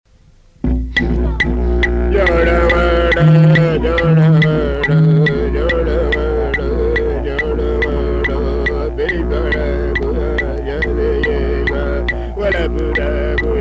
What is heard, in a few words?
North Central Arnhem Land Corroboree